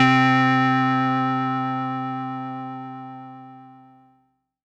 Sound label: lead C3, Korg MS-20; Polyend; Simulacrum Sounds; 1 Shots; Synths; Leads and Keys